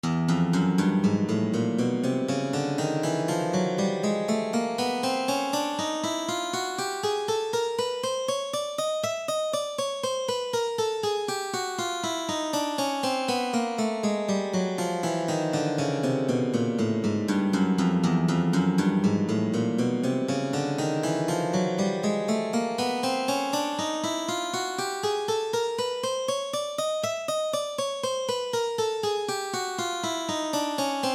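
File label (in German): Chromatische tonleiter über 3 oktaven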